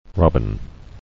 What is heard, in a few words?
robin